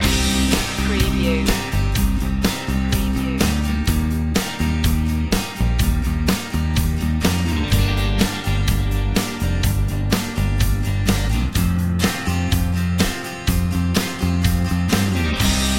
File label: sugar coated loop